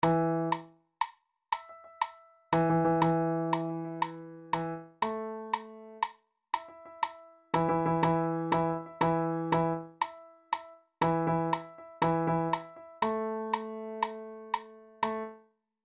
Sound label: Vive Escamillo Bariton; Carmen